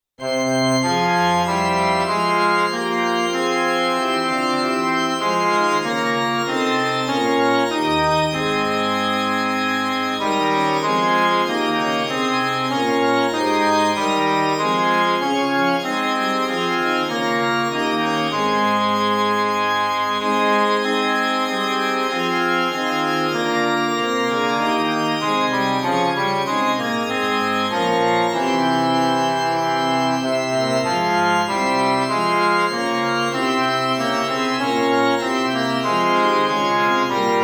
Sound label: Fl Ob Eh Fg Organ, Tonality：f (♭♭♭♭) Tempo：Quarter note = 96; Eh-Organ; Fl-Organ